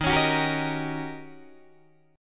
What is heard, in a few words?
Eb 7th Sharp 9th, Eb, Guitar, Open D